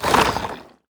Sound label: glare On little Eye